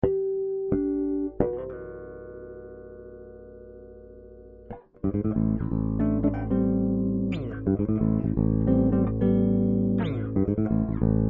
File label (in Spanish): bajo